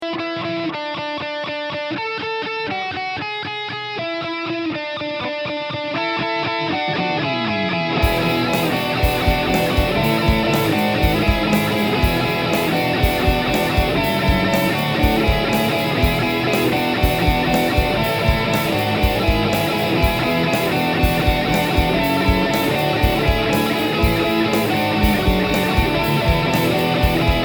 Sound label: Dual Lead